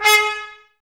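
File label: Brass trumpets G#, Mute; Miroslav Vitous - Woodwind Brass Ensemble; Tps Mute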